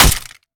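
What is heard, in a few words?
melee character gun large steel; viper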